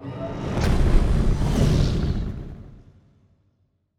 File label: ship laser move; ships; pre-FTL; Stellaris; first contact